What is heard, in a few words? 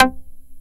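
TURBO ACID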